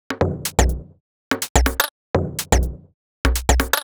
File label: Top B 124bpm, House Drumloops; Zero-G House Fabrik Drumloops